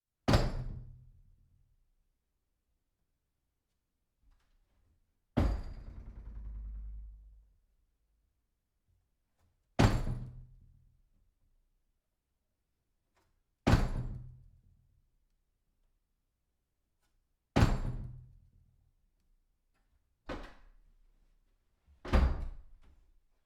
door hitting backstop close

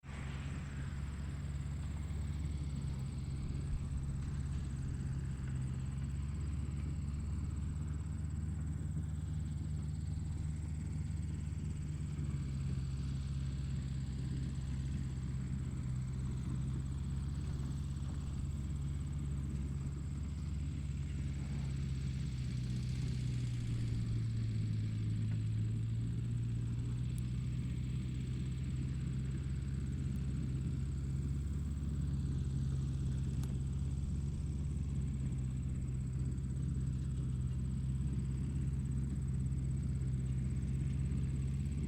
tractor background noise

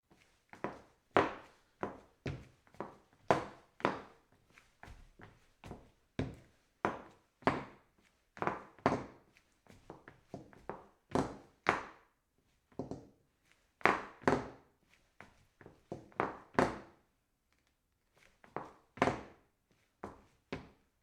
high heels walking series

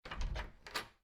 wood door open

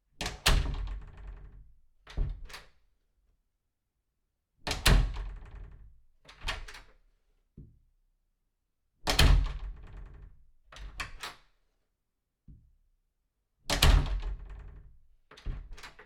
wood door close medium

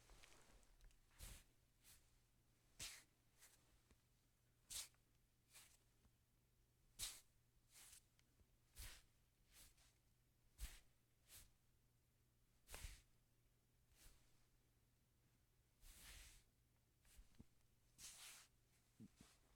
rub head

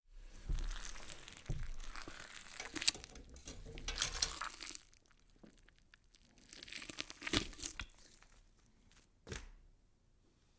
wet tearing meat

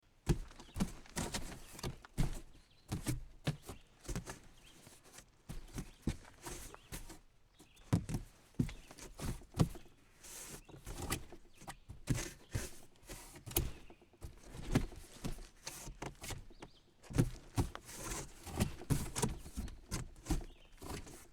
using cardboard, foley

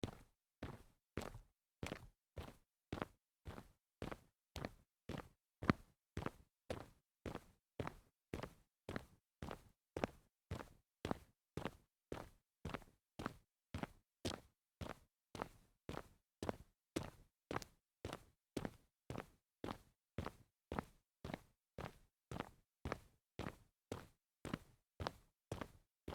walking on concrete series

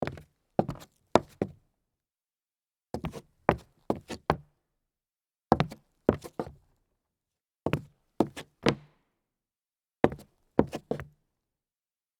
turnaround on hollow wood, foley

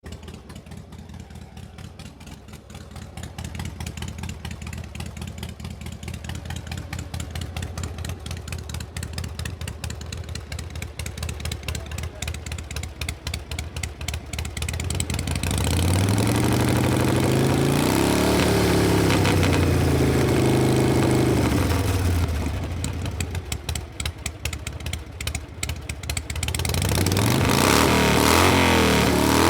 panhead motorcycle

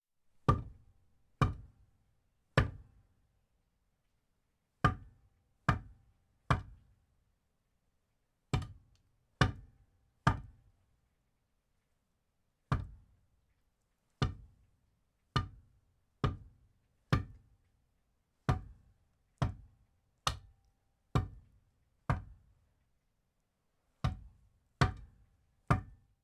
sledgehammer handle cement

